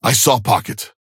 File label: ping saw synth